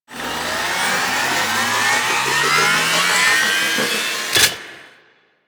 Spark Rifle Wind Up; Grineer

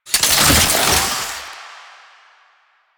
Soldier Zipline Start; Enemies; Soldiers; Warframe; Lasrian

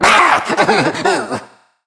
Treasure Box Pain; Warframe

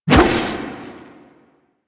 Staff Swing; Warframe; Duviri; Dax; weapons

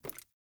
Ladder Climb Wood; Player

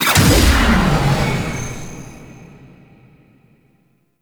Focus End Burst; Warframe; Focus Boost

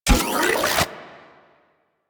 Charge Up A; Warframe; Weapons; Corpus; Vandal

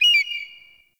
Forest Open Glade Bird Far, Day; Ambience

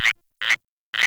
Close River Frog; Ambience; Frogs

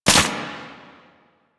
Archgun Low Clip; Warframe; Archwing; Weapons

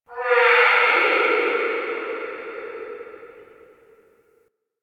Spirit Chatter, Ambience

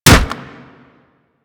Staff Impact Flesh

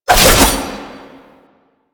Passive Sickle Spin; Warframe; Warframes; Dagath

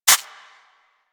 Rodent Step Dirt, Forest Rodent Foley Footsteps; Characters; Warframe

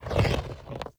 Fire Rain Ground Rupture; Powers; Fenn

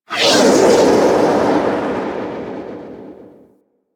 Exterior Solar Winds; Ambience; Warframe; Corpus Railjack

